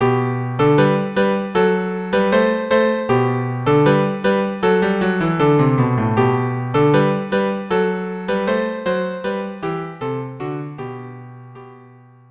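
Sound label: violin cello; dance of hercules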